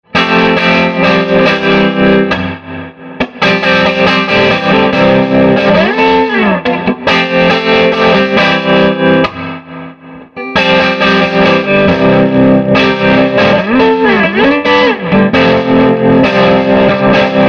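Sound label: SG crunch tremolo, Gibson Historic SG; Swart AST Pro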